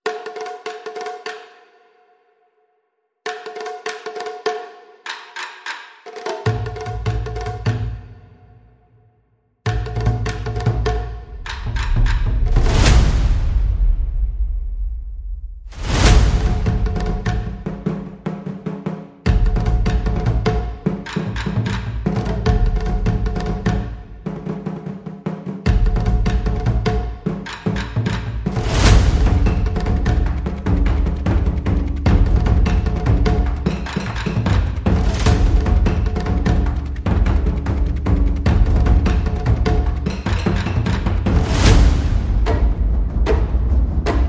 percussion

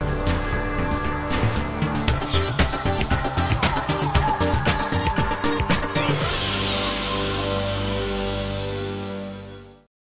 Fastdrive

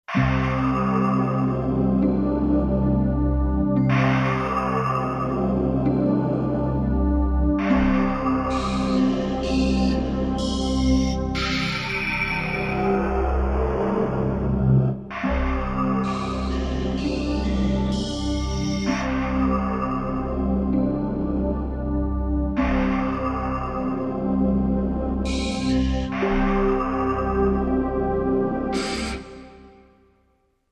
Slow Dark Industrial

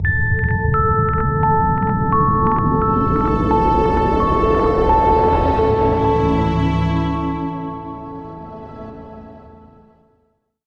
Sonic Chimes

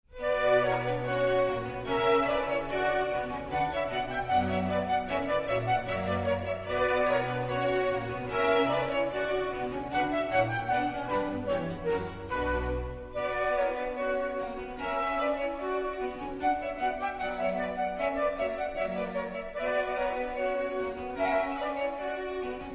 Orchestral suite in B minor Rondeau; BWV1067

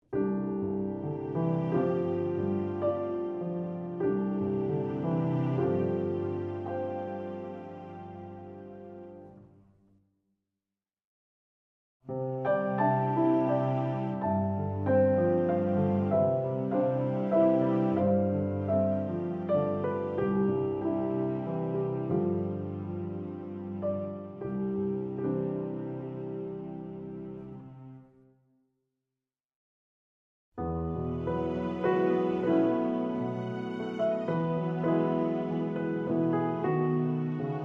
Underscore With Scenemaker Strings

